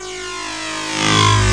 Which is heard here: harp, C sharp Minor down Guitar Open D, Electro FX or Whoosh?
Electro FX